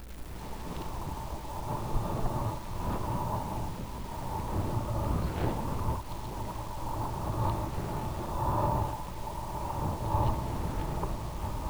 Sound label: WIND